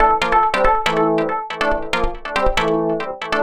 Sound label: TECHNO SYNTH 140; Keys